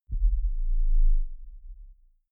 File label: deep sub rumble short